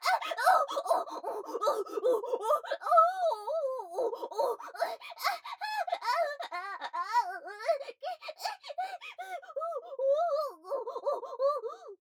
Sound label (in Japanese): 喘ぎ声・大きめ